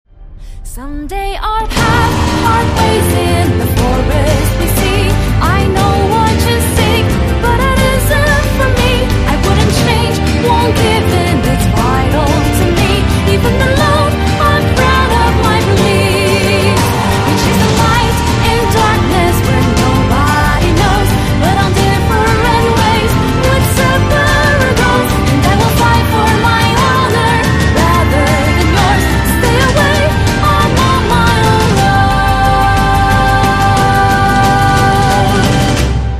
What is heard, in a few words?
boss battle